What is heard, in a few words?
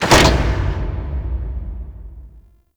Space Port Large Door Close; Venus Open World; Objective Rooms